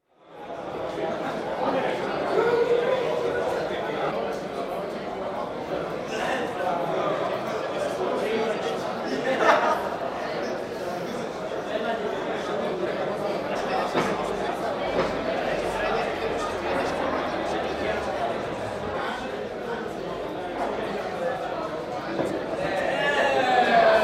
very large restaurant in Bratislava, lunchtime, with noisy speech, clinks and general hubbub; Stara Sladovna restaurant; BBC